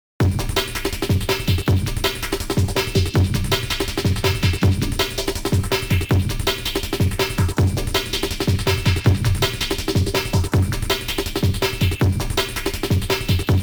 Continue; king of fighters n.e.s.t.s. dream sound legacy

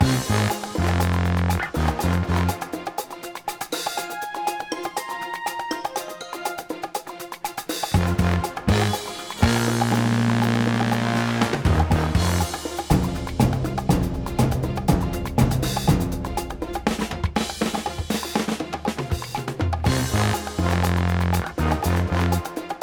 Theme Loop

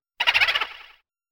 jingle ~ boo

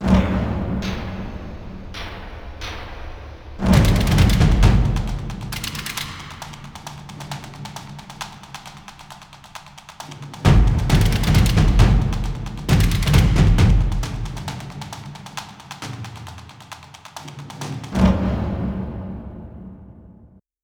Training Stinger; dying light gamerip 2015

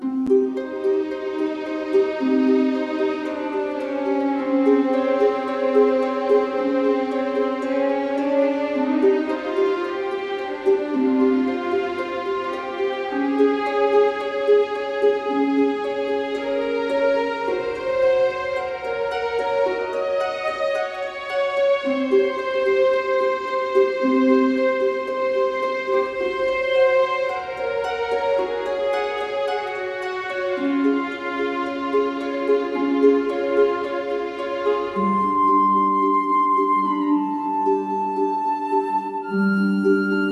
Wind Temple; the prophecy lies

